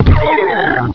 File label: fall; quake2; ranger